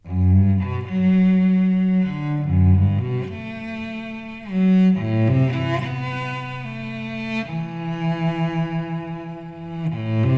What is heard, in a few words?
strings, Sad Loops